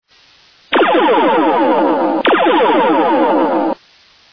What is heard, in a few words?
Laser